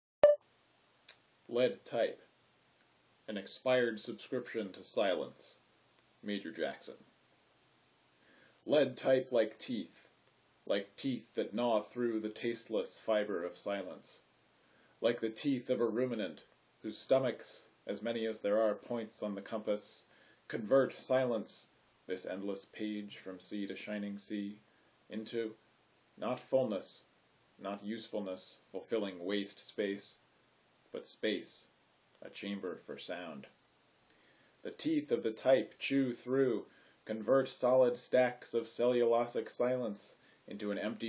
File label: Lead type